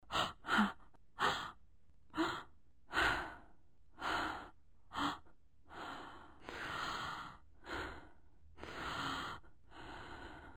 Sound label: frightened breaths